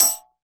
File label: Req Tik, Tambourines; Percussion